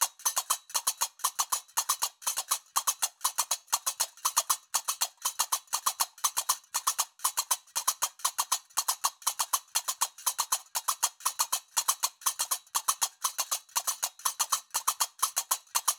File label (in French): loop binaire tempo 120; Qaraqab; qraqeb; Maroc